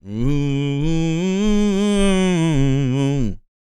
MOANIN, Vocal Planet Blues & Soul

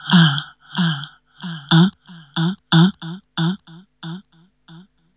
VOX, Hip Hop R n B; USB Soundscan vol.19; AKAI; HHFX 89

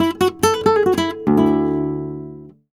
Flamenco Guitar Picado, a; Best Service ProSamples vol.46 - Flamenco

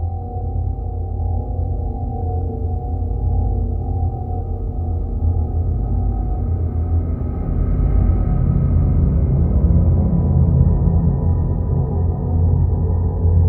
ambience

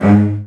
CELLOS A#2; ILIO; Synclavier Strings

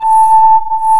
DIDI PAD, SYNTHESIZER

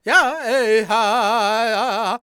G# MIN, World Voices, LAKOTA

